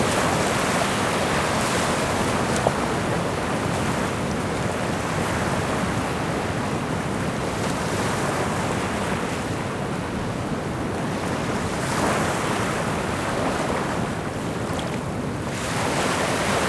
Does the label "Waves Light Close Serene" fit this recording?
Yes